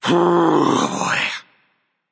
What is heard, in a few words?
nv sigh; smite; voicepack; mercury